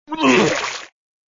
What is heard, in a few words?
reaction vomit; coffee shop